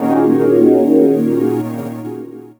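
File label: Pad Synth; Equipped Music; Loungin House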